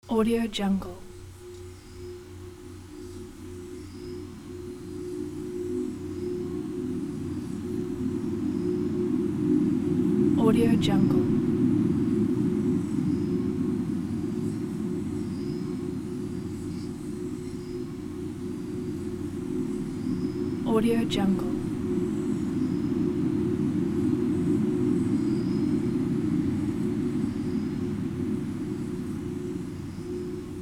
gloomy deep ambient sound; april-rain

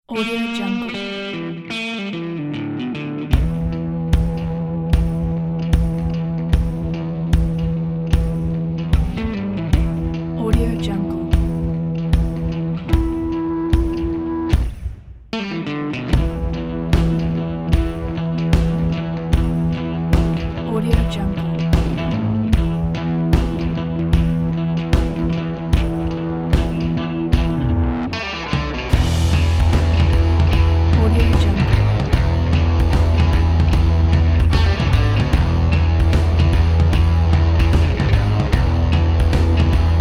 the badass slowmo

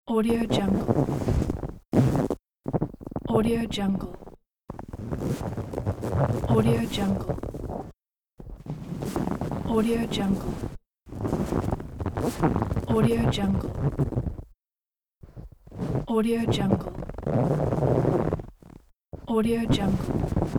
roll a snow ball for a snowman; kaelv

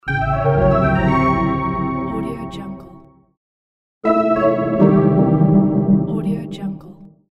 space win and lose

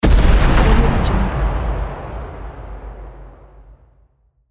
big explosion

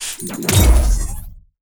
steampunk weapons uber god gun single shot; soundmorph

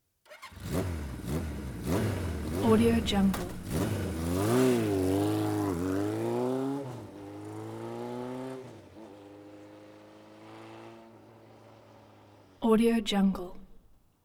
kawasaki z1000 z1r motorcycle

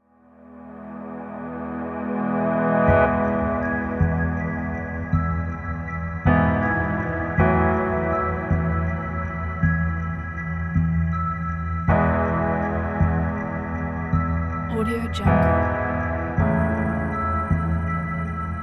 documentary soundscape piano

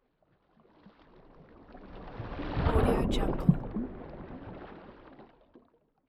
underwater whoosh

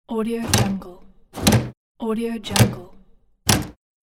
door close sound

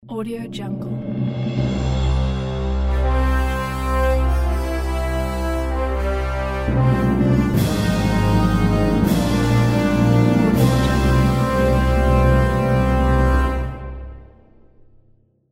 fanfares logo

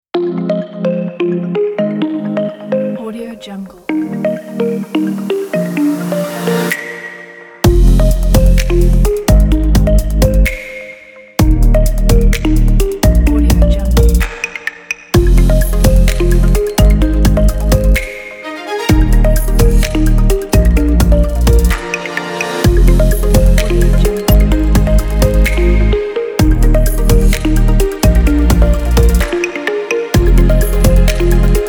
digital technology

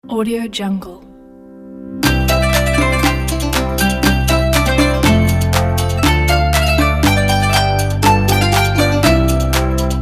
oriental jingle bells

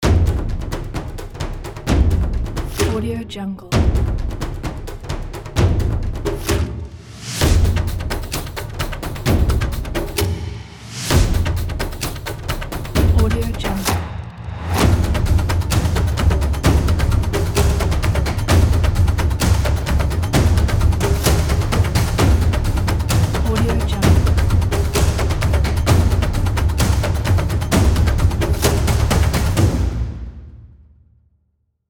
ethnic percussion

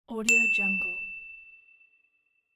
gold shine ding

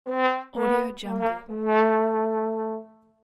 sad trombone

